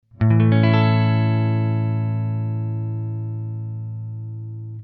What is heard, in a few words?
e shape a major; fretjam